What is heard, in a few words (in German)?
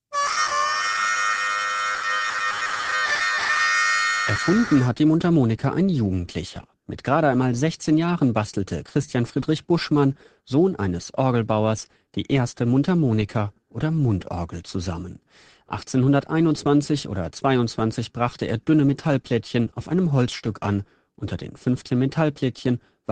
Mundharmonika